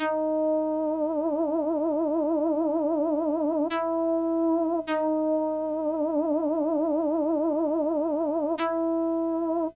syn G, Hip Hop & RnB; U know How We Do

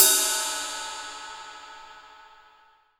TIP, 18 ZIL RIDE

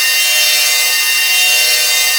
A#4, TURBOBELLS; Northstar - The Wizard VOL-1

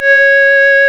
REED C#; Big Fish Audio; Synclav FM

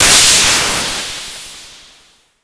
Cwejman S1 Huge Noise Snare

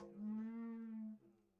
wipe, CONGA; KILLER CONGA

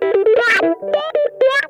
STRANGLED, Funk Guitar; Zero G - Funk Guitar

